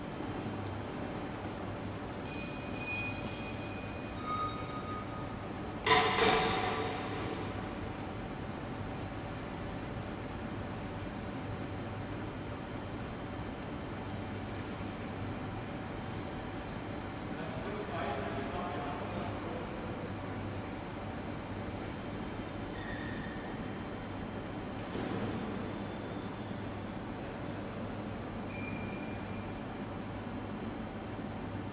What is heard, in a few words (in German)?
darmstadt hbf waggon kuppeln